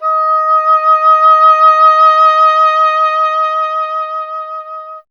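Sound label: oboe, wind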